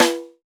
House Snare; Snares

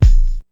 Kick, Ensoniq ASR-X Pro

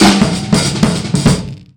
95 bpm, Breaks; THE SKIP; POTBreaks

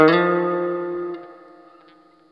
Guitar & Bass; Quantum Leap; 90's Sample CDs

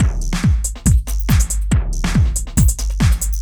Beat, Drums, Eclectic Electronica

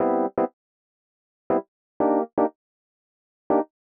120 Rhodes G, Keys, Nu Jazz House; PowerFX

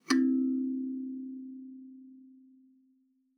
KALIMBA C4; Xsample VOL-7

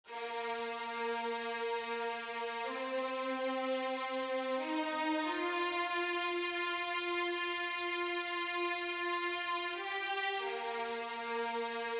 strings, Smooth RnB 093 Ebmaj

